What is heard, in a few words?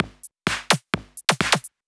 Straight Loop 128 BPM, House